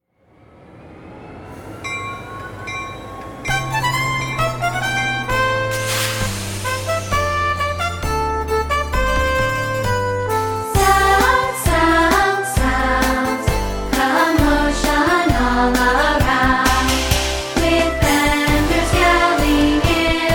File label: w vocals